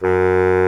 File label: BARI SAX F#2; Greytsounds VOL-1